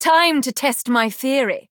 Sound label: attack; insightful scholar